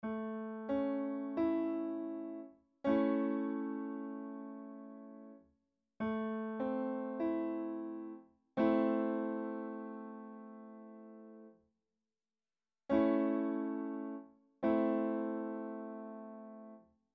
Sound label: piano A-Am